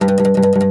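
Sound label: OUD tremolo, Plucked Strings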